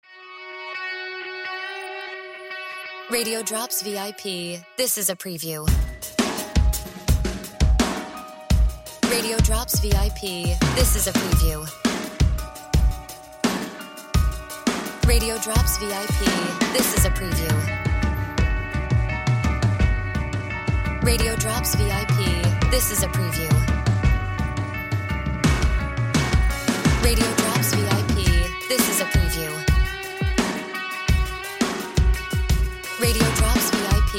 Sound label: Music Beds